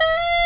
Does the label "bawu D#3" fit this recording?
Yes